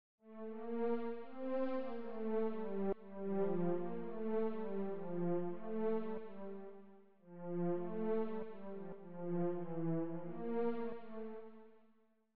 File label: melodia